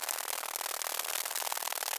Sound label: hornet idle large